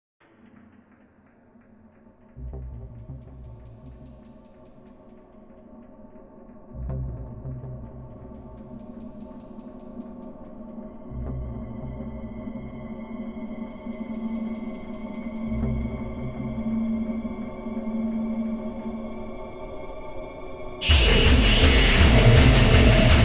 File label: Instrumental; Mortal Kombat